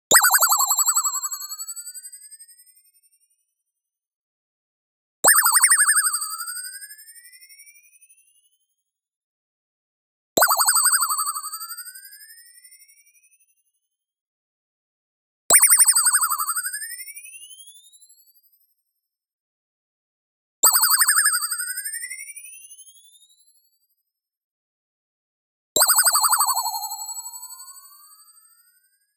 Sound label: TONAL Ping Wobble; boom library; Anime Essentials; DSGNMisc